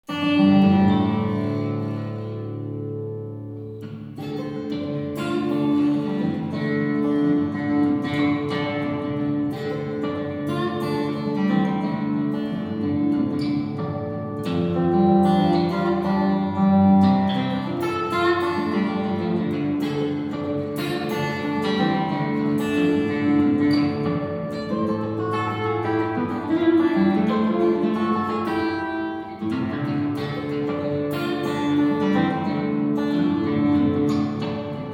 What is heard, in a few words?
Master Room XL-305 Clone Acoustic Guitar